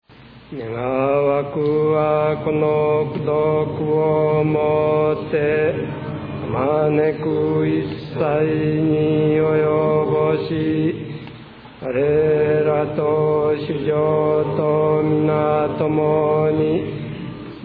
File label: end chant